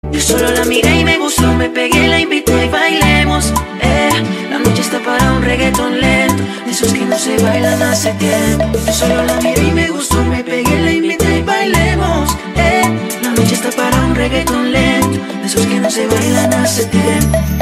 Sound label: bach